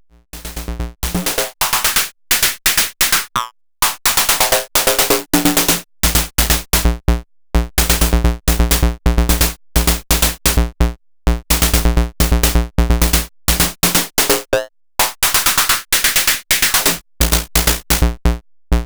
Morphing Groove; Body Synths Metal Fetishist

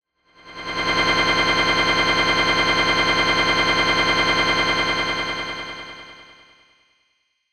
Granular Engines Low Density no Jitter; SonuScore Nordic Spheres

